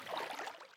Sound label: water step; footsteps